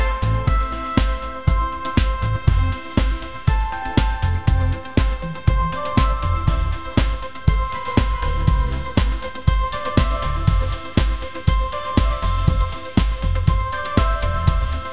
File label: upbeat pop melody